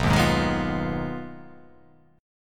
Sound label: B 6th Flat 5th B down, chord